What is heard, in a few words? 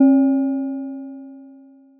inharm stim